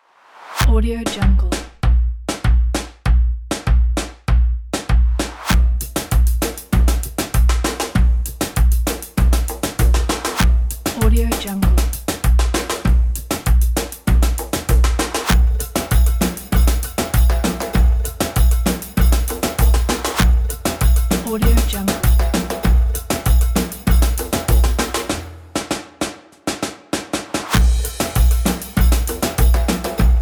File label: cheerful riddim